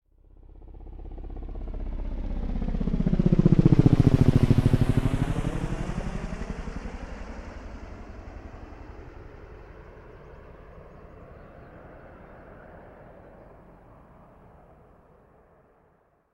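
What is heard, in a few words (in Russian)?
вертолет пролетает мимо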